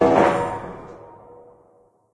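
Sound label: metal randoms impact, ambience; source engine; portal2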